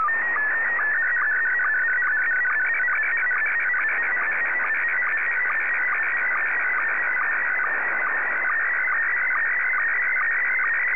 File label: sstv; VK7NMH